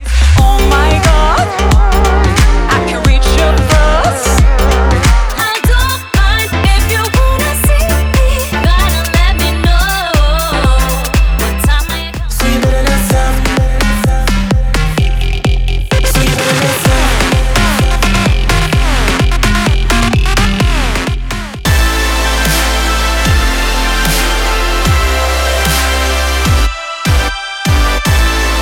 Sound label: Electronic, Dance Pop, Synth Pop